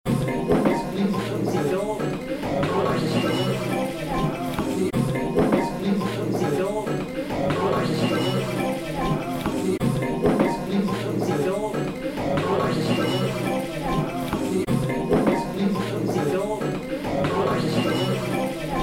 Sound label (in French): séquence musicale de l'ambiance; StudioMobile; Desert Numérique 2012